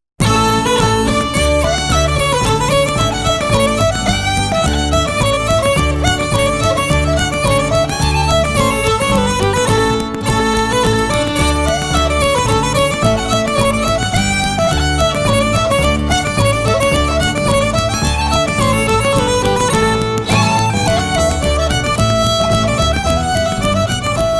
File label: Reel, Gigue Simple; Le Talencourt; Anne Marie Grand